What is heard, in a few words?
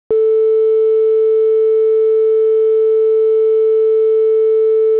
sine; uart